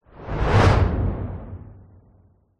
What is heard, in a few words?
sound design sweep transition hard hitting whoosh dark; zapsplat